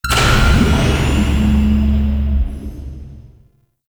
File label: Assassinate Spawn Start; Ambience; Gameplay; Jackal; Warframe; Corpus Ship Remaster